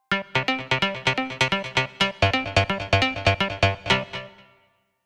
Like techno synth

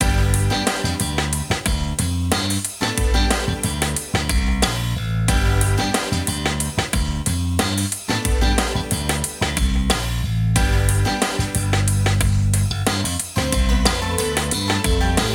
Rhythmic band BGM

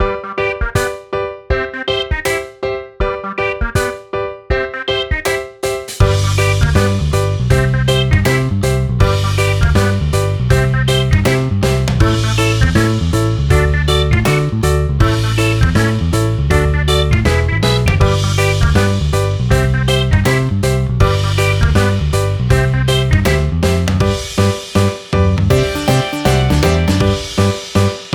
feel bouncy skip

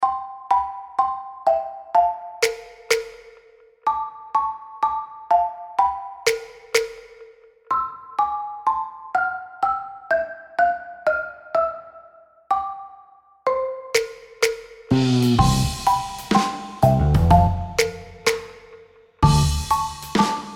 Cute band style BGM

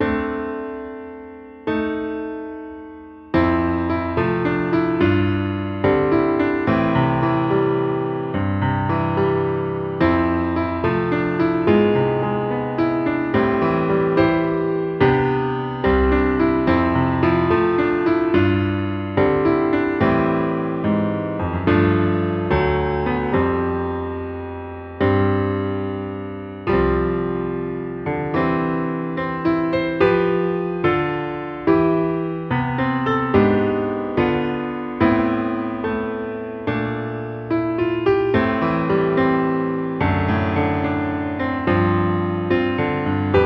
piano

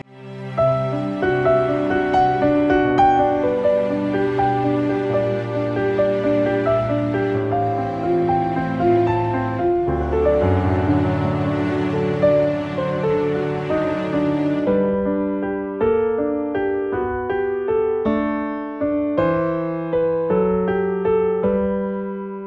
wedding girly song

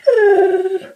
Dog Sound

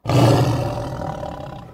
Lion Roar

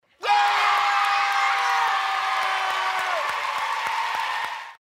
yeah

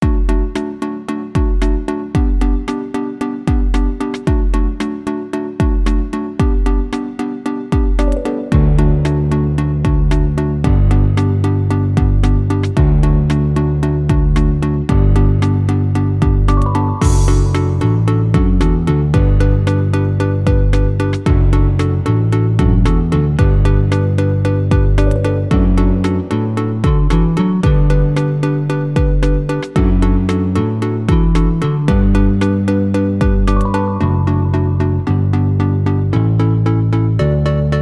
Night train Modern synth music

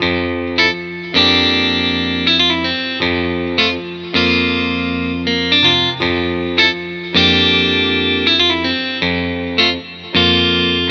Fashionable and beautiful music

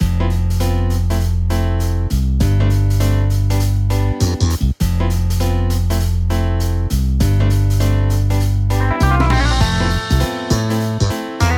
Stylish and cool JAZZ

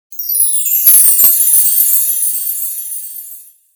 Wind chime

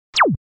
Turn off TV Razer

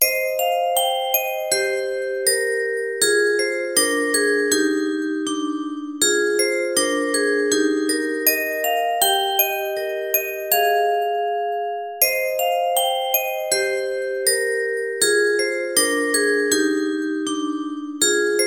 music box

